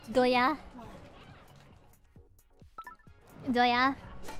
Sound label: doya